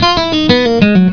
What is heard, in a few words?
GUITAR; psion cd vol1